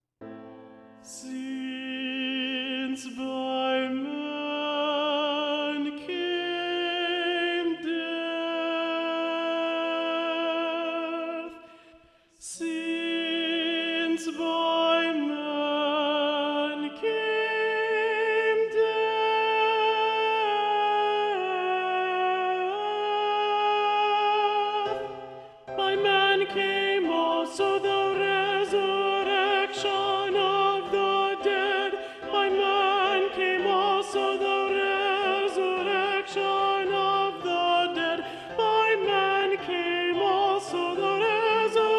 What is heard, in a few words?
Alto Predominant; Since By Man Came Death; Messiah; Handel